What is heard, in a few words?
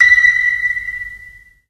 sonar ping; deliantra